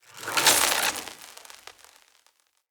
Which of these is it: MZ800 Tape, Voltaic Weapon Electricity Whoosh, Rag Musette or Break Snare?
Voltaic Weapon Electricity Whoosh